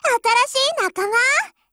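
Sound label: weapon get; m4mod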